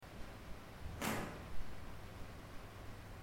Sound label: 3rd floor table moving; shmore estates